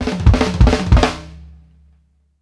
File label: furious; Sampleheads; New York City Drumworks VOL-1; KD FILLS 84